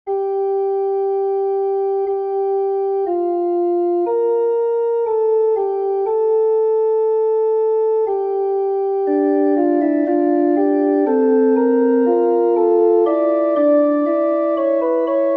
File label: fughetta for pipe organ; bach johann sebastian; nun komm der heiden heiland